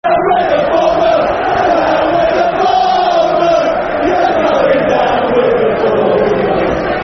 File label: Football Chant (Soccer Song); Come On Bournemouth; Afc Bournemouth Afcb